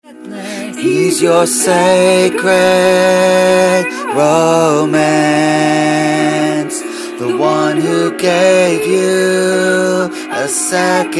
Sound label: alto